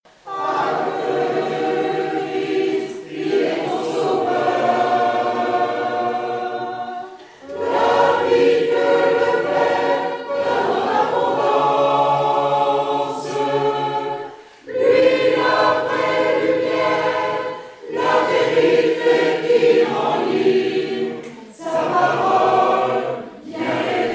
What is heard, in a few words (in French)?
Tutti; Ecoute ton Dieu t appelle